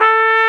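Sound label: CORN LIPS D4; AKAI S-Series CD-ROM Sound Library VOL-2; CORNET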